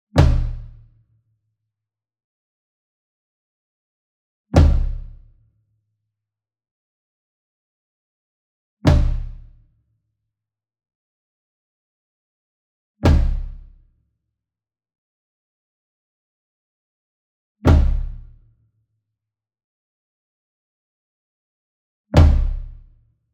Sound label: SINGLE DRUM Tom Concert Flam Soft Beater Near; boom library; Cinematic Strikes Construction Kit